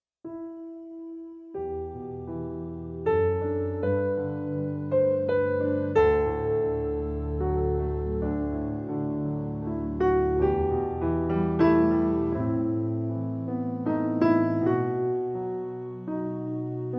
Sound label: Piano; Naked Piano Christmas